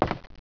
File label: footstep wood; footsteps; gearbox